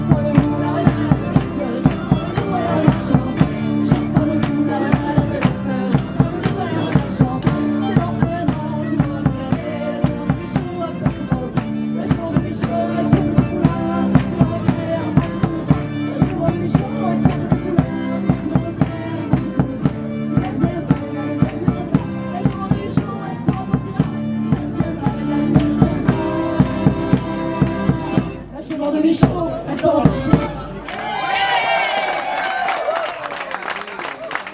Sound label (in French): jument, ambiance; celtik azur; michao; vive la bretagne